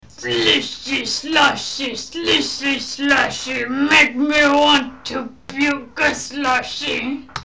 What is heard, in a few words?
kat puking; seas were a'rockin' Saturday afternoon